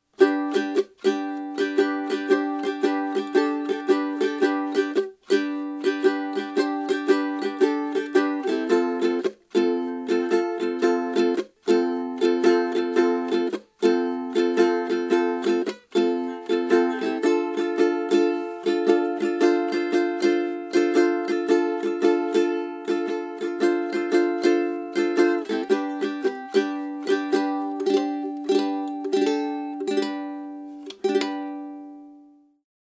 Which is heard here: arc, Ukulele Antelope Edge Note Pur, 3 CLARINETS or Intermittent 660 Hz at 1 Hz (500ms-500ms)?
Ukulele Antelope Edge Note Pur